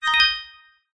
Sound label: multimedia alert positive bright; zapsplat